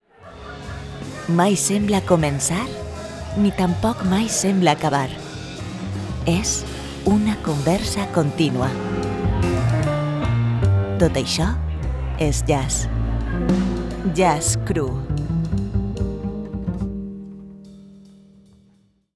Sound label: SEPARADOR JAZZ